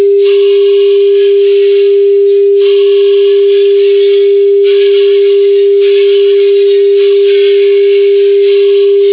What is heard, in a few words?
with hum